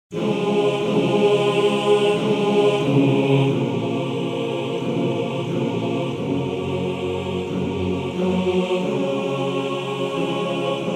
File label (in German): Chor; Morgenrot